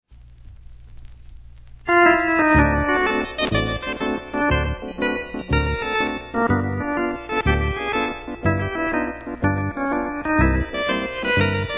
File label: hammond